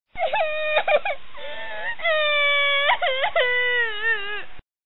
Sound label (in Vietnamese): tieng khoc